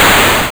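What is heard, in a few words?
explosion; pygame platform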